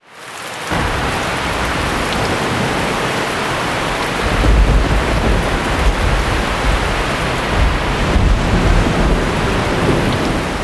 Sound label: Rain Thunderstorm Waves; Nature Sounds And Backgrounds